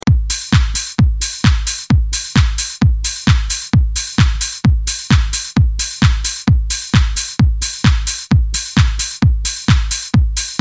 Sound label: Dj Intro Latino Hook Redrum